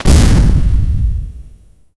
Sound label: short fiery ignition soun